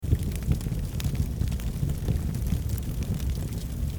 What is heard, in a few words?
brick oven